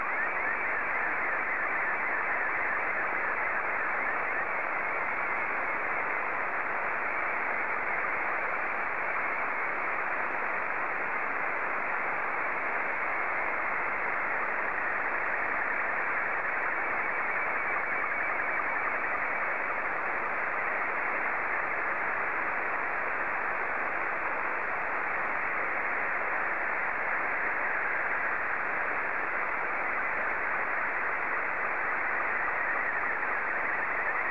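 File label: SSTV, Scottie 2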